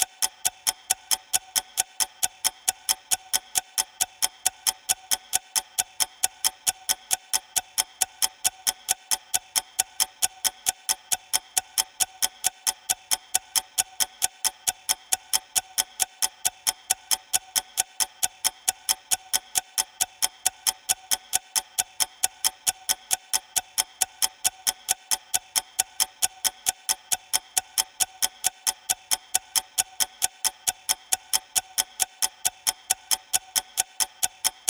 haber tiktak saat fx; By Burak Sound Bank; haberler news

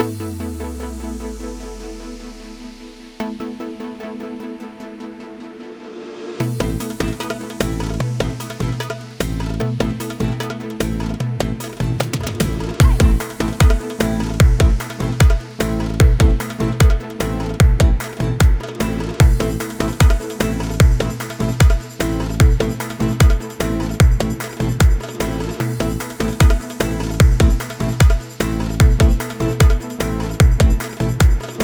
arabesk oryantal mezdek nosolo; by burak sound bank; arabesk oryantal tema vol 1

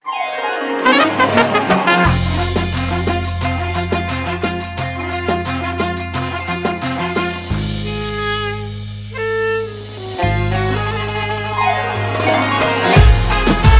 enstrumantal vers 88bpm

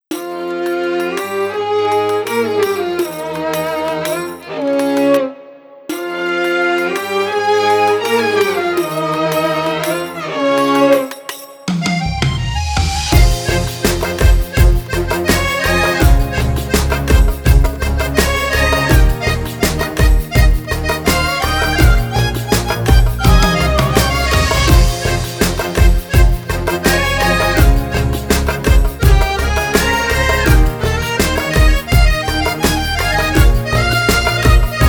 slow, arabesk oryantel; by burak sound bank; arabesk oryantel vol 4

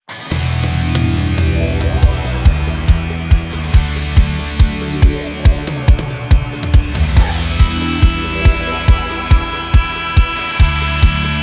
artvin horon rock show enstrumantal; by burak sound bank; anadoludan selam var vol 1

